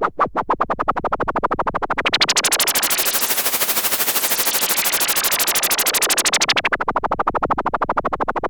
Yamaha CS5, Filtered Noise

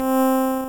Beep; Bitkits; Blip & Blop

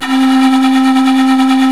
SIKU VIB C2; The Andes

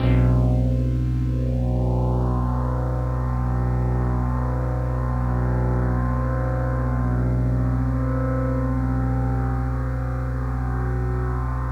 One Shot Drone B, dystopian drone; One-Shot Drones

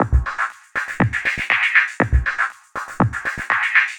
Filtered Beat, Trance; Sonic Foundry (Sony Creative Software); Trance NRG VOL-2; Drums

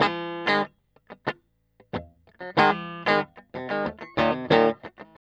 TELE DUO; guitar; Spectrasonics; Hans Zimmer Guitars VOL-1

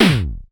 Kick, Lel UDS

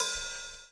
lash, backbeat

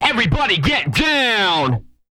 get down, vocal, hip-hop; TB HIP-HOP

